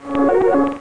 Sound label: row filled; Tetricycle